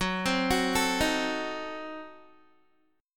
F sharp Diminished 7th F sharp Guitar; F#dim7 arpeggiated; 14,15,16,14,15,x

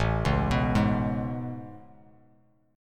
A Major 9th A, Bass Standard

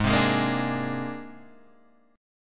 C 7th Suspended 2nd Sharp 5th Ab

